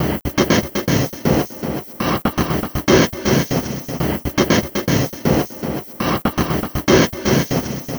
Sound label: Envelope Beat; Drums & Drones